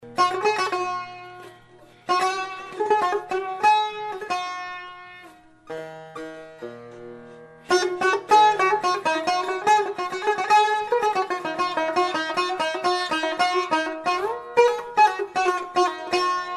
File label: persian tar audio sample; Iranian Tar